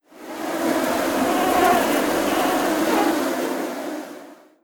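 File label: Dis Mosquito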